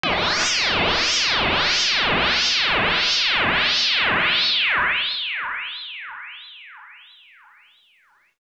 Ring Mod; AMG - Prototype Drum & Bass

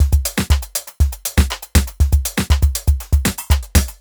Basic, Beats, Nu Jazz House, 120; PowerFX

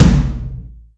Night F, Acoustic Tom; Yamaha DTXpress

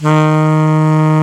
SAX A. MP D, Alto Short

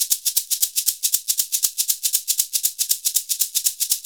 SHK, Shaker; Univers Sons; Basicussions

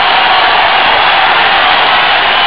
spectator; ballistix; Amiga Soundtracker Sample Packs

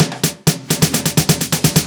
Fills 128BPM, Clubsounds

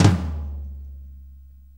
4-4 Tom Flam; 4-4 Drum Loops; Flams; Drum Tools

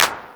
808 Clap Odd, Drums One Shots Percussion; Groove Elements

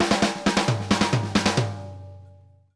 TOMS, drum fills; Sampleheads - New York City Drumworks VOL-1

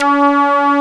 SYN ANA3; Best Service Gigapack 1

